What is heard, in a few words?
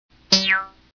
toing